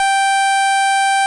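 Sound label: OB8 organ C4, OBERHEIM OB8; Big Fish Audio - First Call Vintage Keyboards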